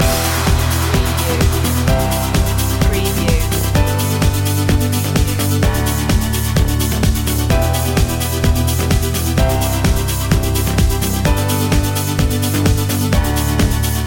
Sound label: surf loop